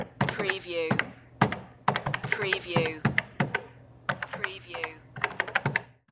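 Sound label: Cash Register Buttons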